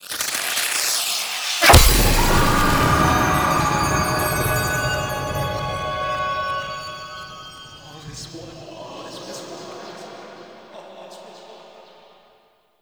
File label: Veil Attach; Narmer Outpost; Chamber Ceremony End